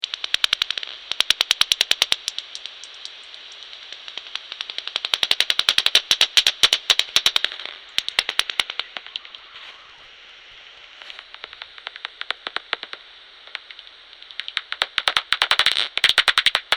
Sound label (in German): Rauhaut Jagd Ruf